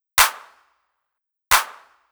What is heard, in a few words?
Clap; хлопок